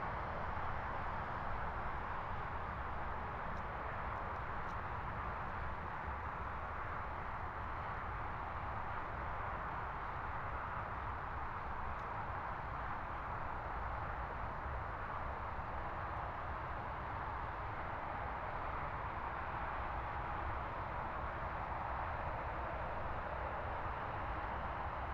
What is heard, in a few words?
ambence traffic cars outdoor; Dolby Atmos